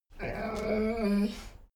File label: dogo argentino whine grunt; natur-tiere; hunde